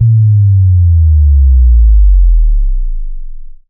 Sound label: SubDrop Basic Simple